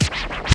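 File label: cold scratch; Perc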